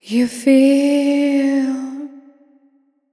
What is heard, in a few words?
You Feel, Vocals Wet; GhostHack